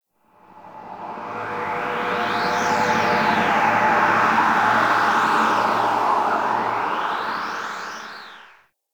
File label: Exterior Void Fog; Ambience; Warframe; Railjack